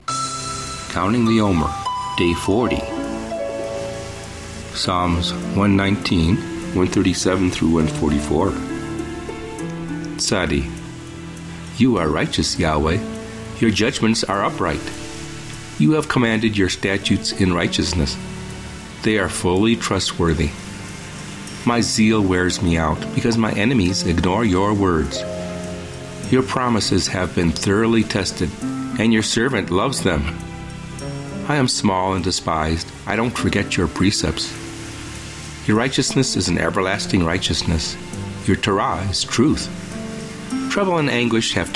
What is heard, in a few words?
Meditative w Waves Ocean; Omer; Psa119